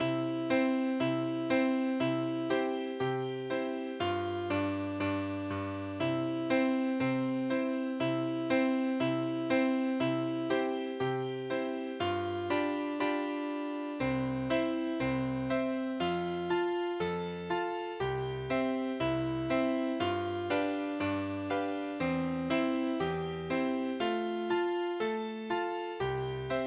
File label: Rag Time, midi; honeybee march